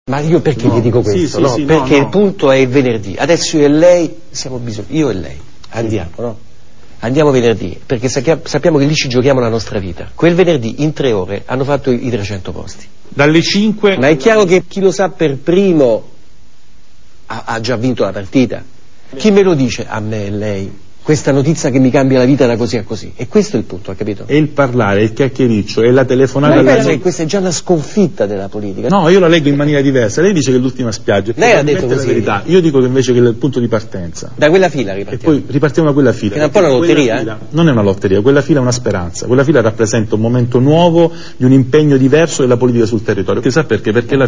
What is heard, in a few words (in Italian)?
assolo